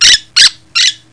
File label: Birds